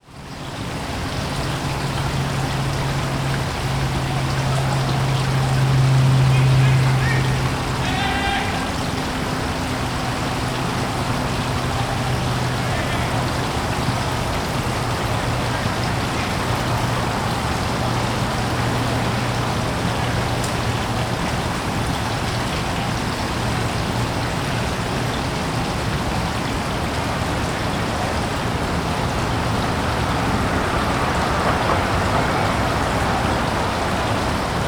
Downtown Houston Root Memorial Square Shade Pavilion Water Feature Ambient